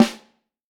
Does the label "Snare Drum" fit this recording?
Yes